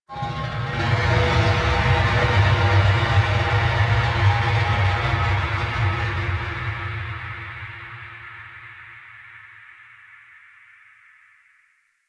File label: Chimera Main Room Creature One Off; Ambience; Warframe; DE